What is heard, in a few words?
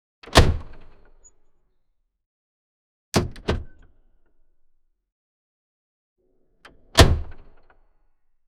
car